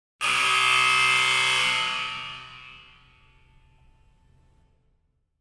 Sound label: basketball buzzer